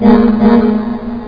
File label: HORNMEGA; wheels